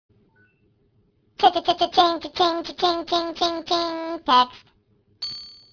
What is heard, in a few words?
Chipmunk cha ching